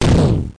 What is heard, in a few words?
Magic; Total Chaos Nukem 3d Pack; duke